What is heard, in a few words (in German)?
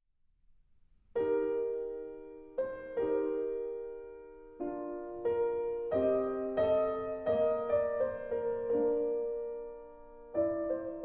Klaviersonate Nr 4 Es Dur; W.A. Mozart